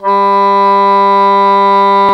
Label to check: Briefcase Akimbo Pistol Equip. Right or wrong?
Wrong